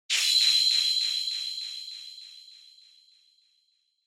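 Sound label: Onoma Syakiin Delay, シャキーン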